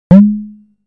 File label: Wasp-Drum